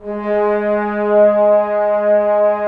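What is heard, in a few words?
trombones; Roland LA Composer vol. 4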